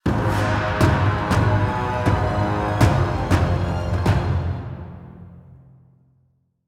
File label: Stinger; Commander Mulverick